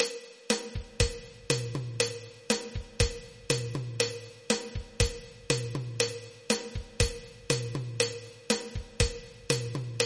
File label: chacha; Creating Rhythms